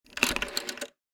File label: rotary phone